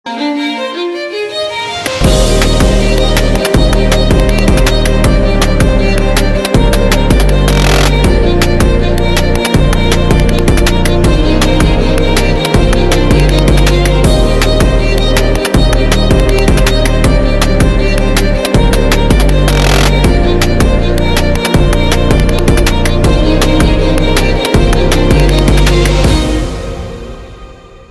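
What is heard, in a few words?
beautiful melody with violin; ochen klassnyy zvonok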